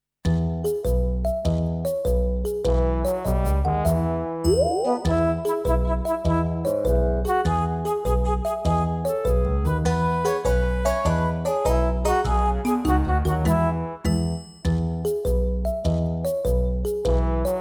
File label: Melody